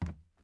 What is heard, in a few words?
plastic step